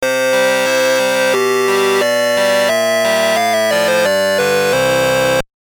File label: Jingle; battle spirit digimon frontier; wonderswan color